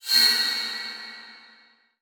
Transition Whoosh Medium; Production Element

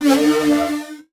Multimedia Internet CD-Rom Flash Wipe

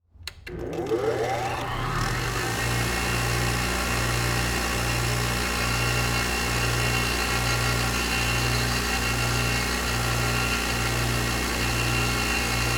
Industry Tool Grinder Turn On And Off

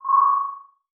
Beep Low Pitch; Multimedia Internet CD-Rom Flash

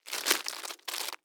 Horror Scary Violence Guts Falling Out Body

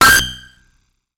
Multimedia Internet CD-Rom Flash Beep Mechanical

